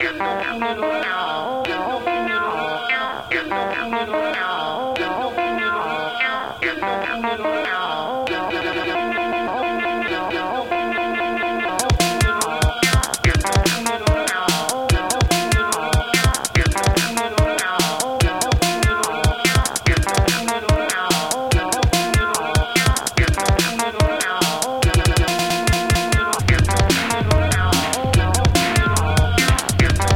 Electro Break Test

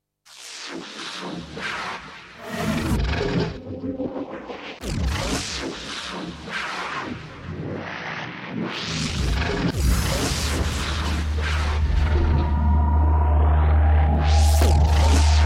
Uk Hardcore Frenchcore Crossbreed Mix

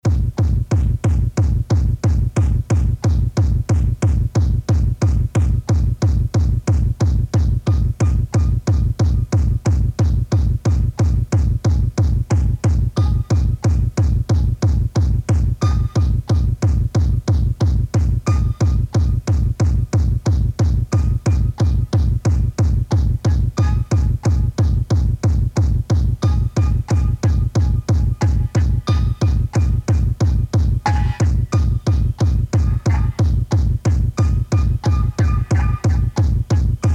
liveact french hard techno

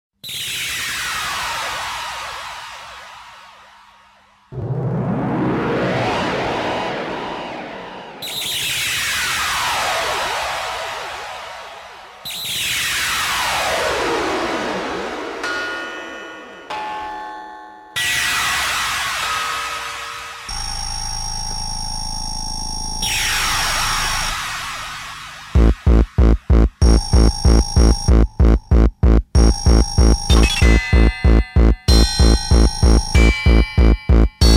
frenchcore mix

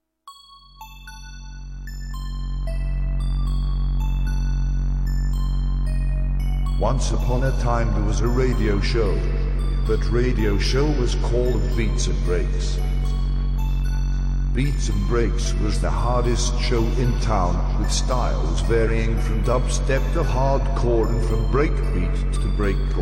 Beats N Breaks Radio. Live Oldskool Vinylset

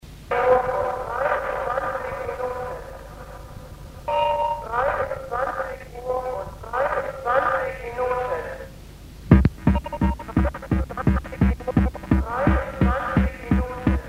live train

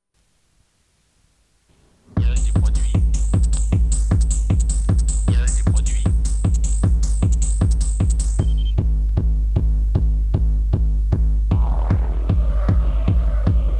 Mix Tape

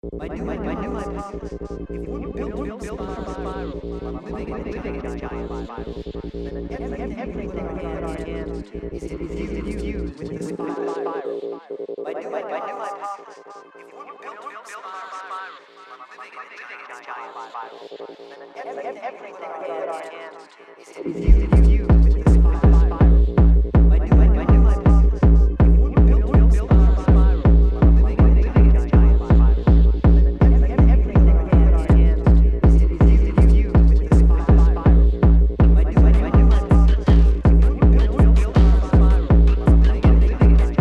Spiral Tekno

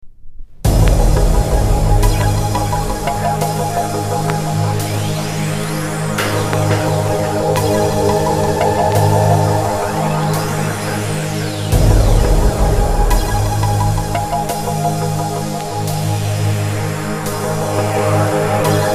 Drum n bass To Hardtek

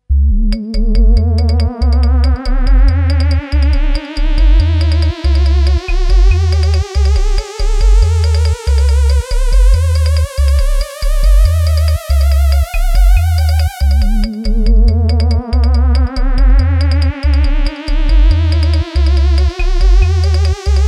Dub Mission

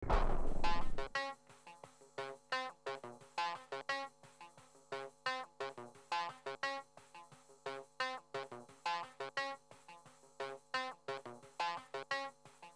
acid, live, teknocamp; Groundzeroteknocamp5

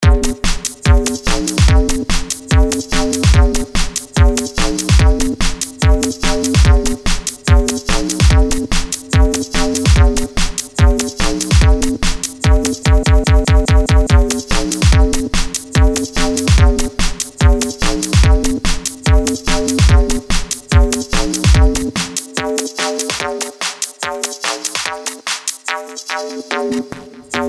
Live Set Electro

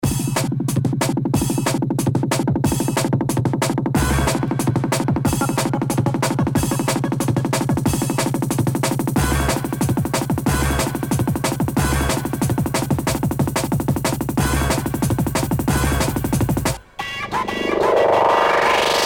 Drum&Bass

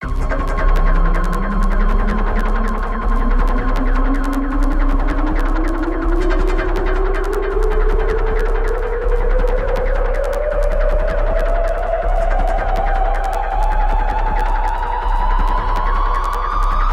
Underground Tekno

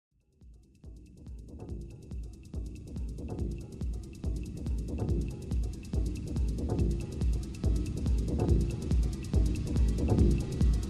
Mainstream Techno And Hard Techno, Mixed

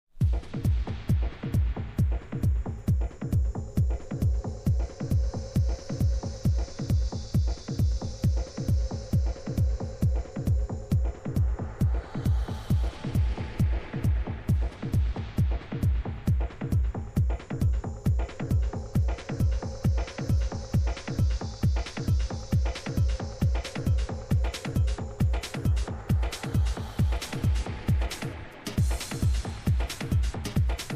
Techhouse And Mainstream Techno

